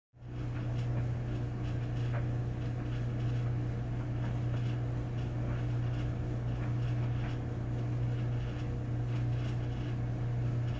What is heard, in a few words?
dryer sounds